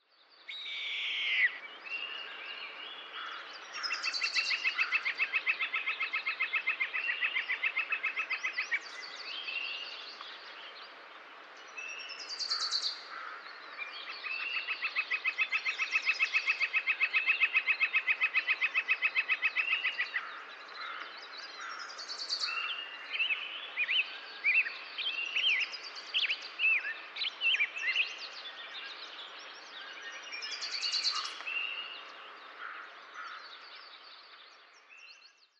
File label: long bird, ambient